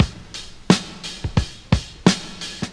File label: coffeehouse, Drum loops Boombap